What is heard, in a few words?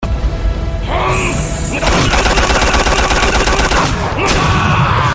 kicks; dio